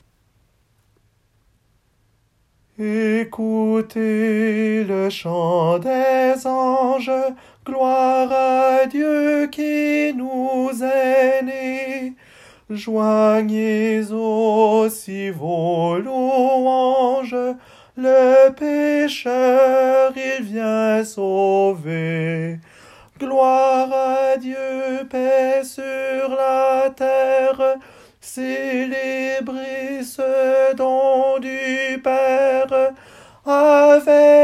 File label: tenor; ecoutez le chant des anges